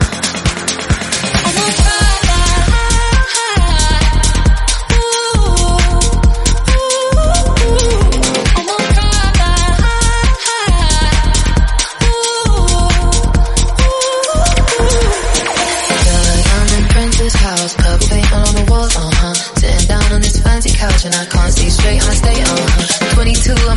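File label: 135bpm F minor Tech House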